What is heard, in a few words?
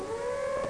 arm; MusicScapes Professional; Wayzata Technology